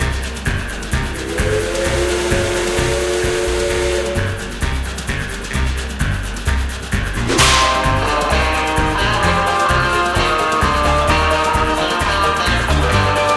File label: freeSpin train; dead or alive 2